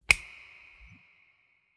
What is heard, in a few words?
enter; Archipelago demo; neode-ui